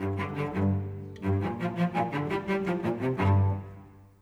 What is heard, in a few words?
Cello, Orchestral; Sonic Foundry (Sony Creative Software); Orchestral VOL-2; Modern 09